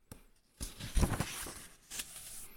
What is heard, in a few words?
page turn